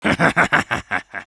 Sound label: laugh short